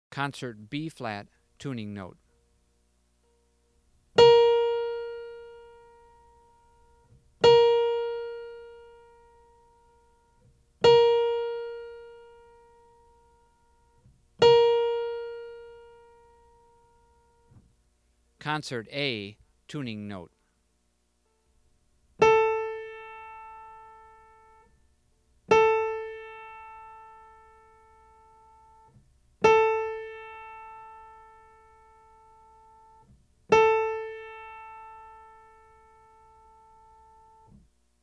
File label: Tuning Notes; James „Jamey“ Aebersold Play-Alongs; Bebop & Beyond